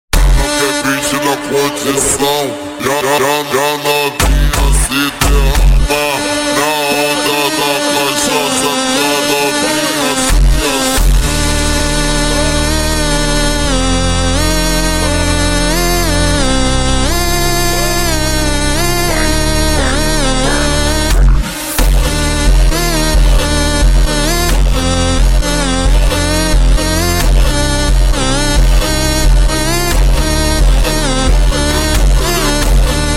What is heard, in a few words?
slide comovente super slowed